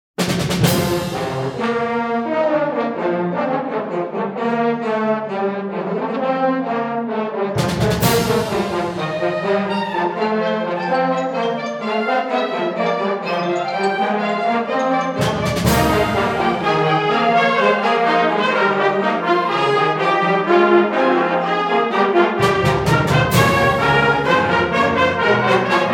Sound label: Fanfare